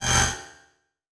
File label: Title Transition Chopper